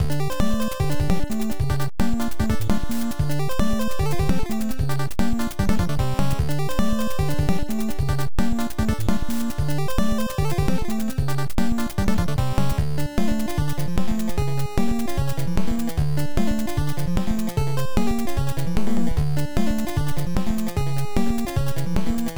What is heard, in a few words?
Earthquake